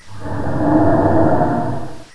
breath; lmctf

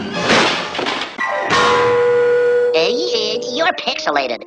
death; vortex; porkypig

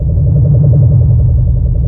spheres idle

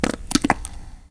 flesh

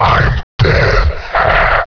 death

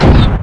step; widow

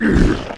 fall; sorbogon

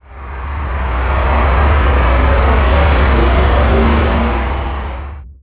starship; spawngirlsounds

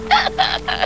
gurp

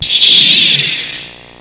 bump; openffa; darth maul

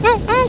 fall; kennyrim

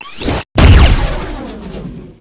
railgun; gladiator; openffa

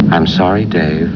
death; openffa; hal

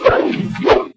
b swing; zaero; monsters; bossz

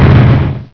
fall; enubis

